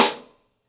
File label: Snare, drums singles; The Roots Drumloops